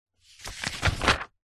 household book manual large page turn; zapsplat

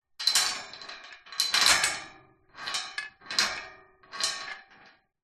industrial chain around metal fence movement; zapsplat

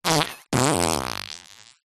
cartoon fart

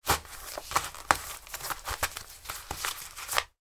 foley boot work shoelace tie; sound effects one

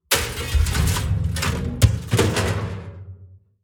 Impacts SIMPLE; soundbits

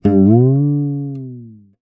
cartoon twang bounce boing spring

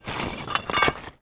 foley broken bricks and metal movement

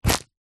foley purse grab hand

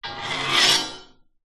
metal scrape on metal bar strip

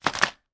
food chocolates multipack 4 down on table; smarties; zapsplat

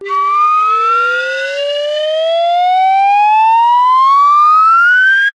cartoon slide whistle ascend climb slow

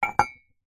household cup mug set down on concrete; zapsplat

